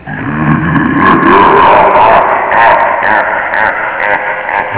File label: round start reptile, zombie plague; cstrike